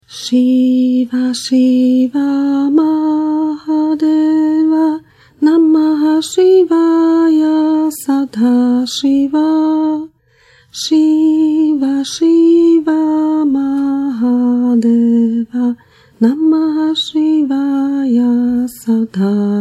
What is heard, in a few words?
Šiva Šiva maha deva